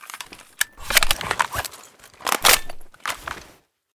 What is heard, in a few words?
usas12 reload, weapons